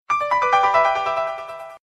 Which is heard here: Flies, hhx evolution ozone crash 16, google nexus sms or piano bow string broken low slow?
google nexus sms